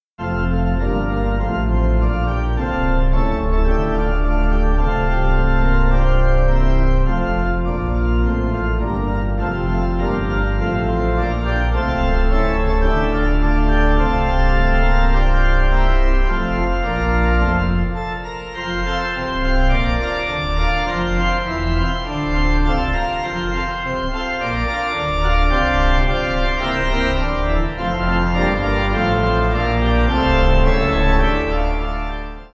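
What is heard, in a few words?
Pipe, Pipe Organ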